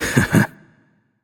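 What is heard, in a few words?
nonverbal heh heh; voicepack; smite; merlin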